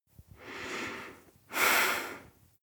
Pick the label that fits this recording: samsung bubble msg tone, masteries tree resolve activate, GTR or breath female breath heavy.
breath female breath heavy